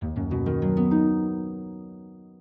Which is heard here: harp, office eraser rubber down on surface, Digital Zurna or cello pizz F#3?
harp